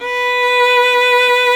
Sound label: VIOLIN B4, SOLO STRINGS; Greytsounds VOL-1